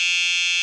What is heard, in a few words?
Error